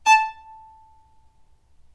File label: Violin Spiccato A4